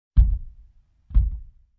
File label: Wood Bang Sound